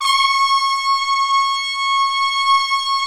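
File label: brass trumpets section; Roland LCDP06 Brass Sections; Tpts Sec.2-3; Tps Sec.3 f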